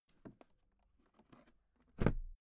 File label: briefcase close shut soft, Büroarbeit